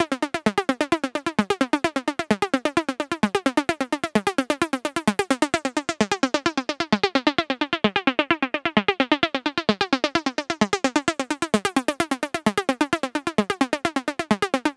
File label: NRG Sweep, Trance